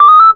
switch

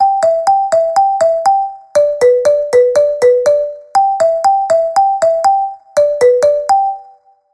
marimba

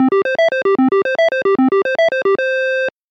easy clear

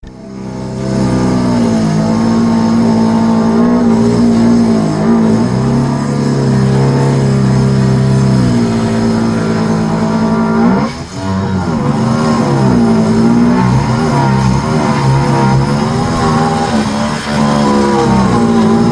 electric saw

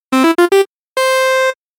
item get

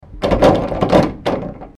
doorknob

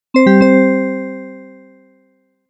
music box

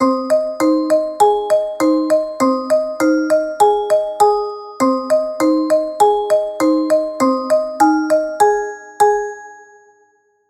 white marimba

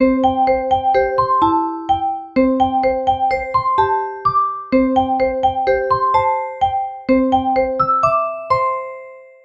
bell short

